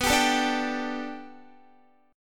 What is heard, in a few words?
B Minor 7th Sharp 5th B Guitar, chord; Bm7#5 strummed